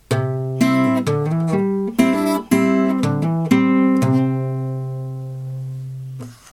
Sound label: a shape major embellishment lick a, guitar